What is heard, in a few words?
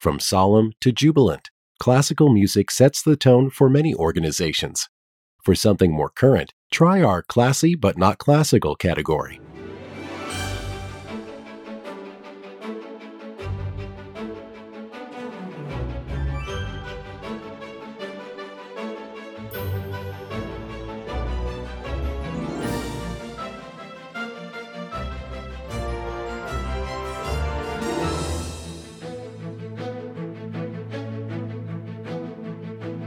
Classical, music on hold